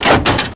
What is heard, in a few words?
metal drawer locked; Max Payne